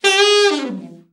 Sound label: BRA ALT FALL, SAX; Best Service Gigapack 1